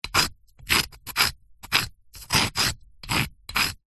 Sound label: stone small scrapes various on rock or brick; foley